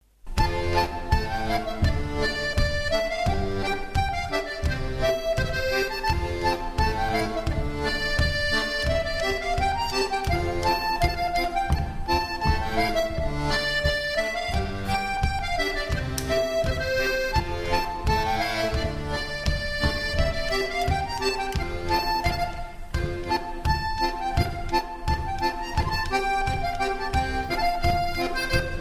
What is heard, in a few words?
Scottish, harmonica